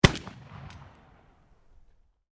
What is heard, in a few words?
PISTOL CARTRIDGE 44CAL FIRING, Black Powder firearms at a shooting range; Ruger 1851; Pistols; Guns